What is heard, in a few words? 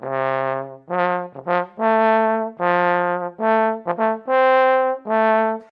trombone; instruments; gcompris-qt